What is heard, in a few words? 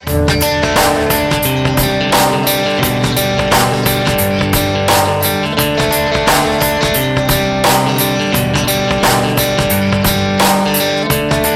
guitar